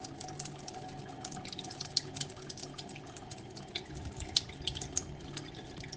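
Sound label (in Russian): звук воды облагаемой налогом в Сантана де Парнаиба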